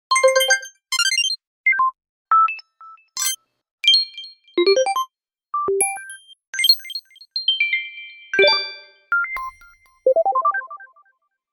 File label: Text Tones